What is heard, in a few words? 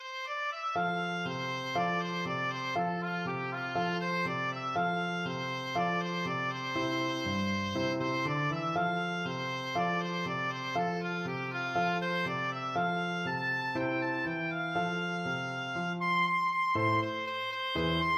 baltimore belle, oboe